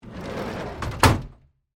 Tool Chest Drawer, Industry, Tool, Chest, Drawer, Close, Large, Metal; Hand Tool Sound Effects; BlastWave